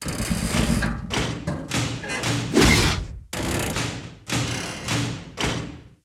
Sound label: Golem Attack